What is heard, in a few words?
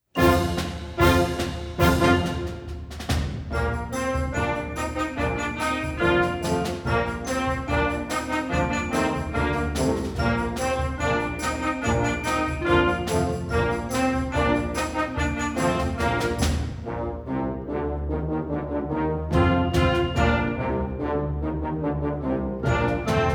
Rock Attack